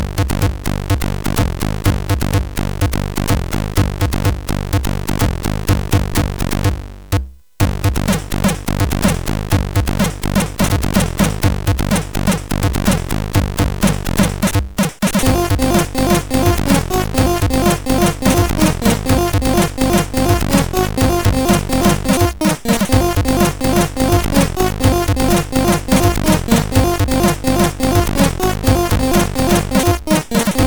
Techno Rave, CSG8580R5

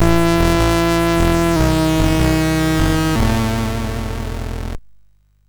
sid 6581; MOS6581R3

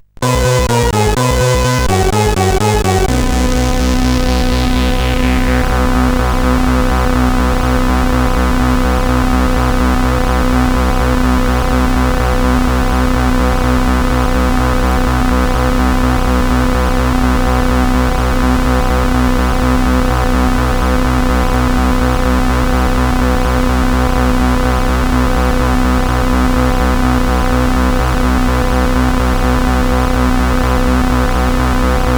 sid CSG8580R5; Splidge and the Snowmen

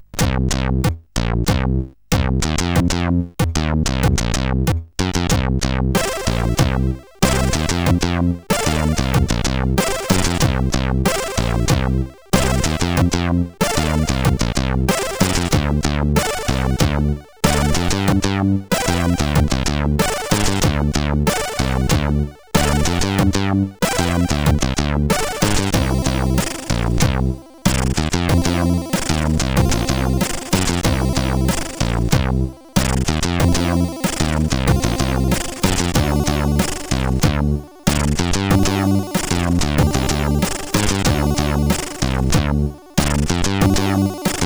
Slow Beat, sid CSG8580R5; X-Radical

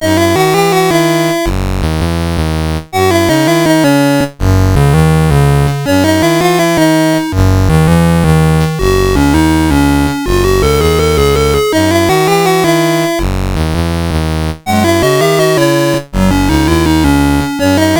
CSG8580R5, GAMES; Scarab

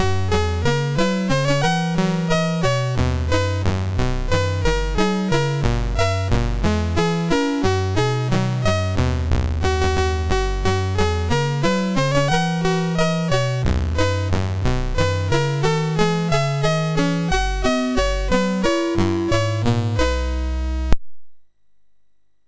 Sys Sound BASIC, MOS6581R3

